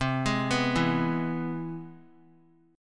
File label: C Augmented Major 7th; arpeggiated; JGuitar